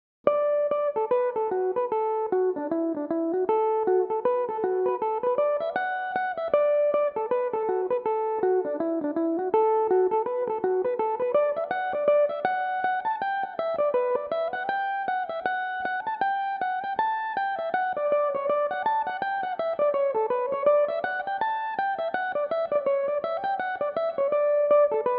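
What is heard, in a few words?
D major, Irish mandolin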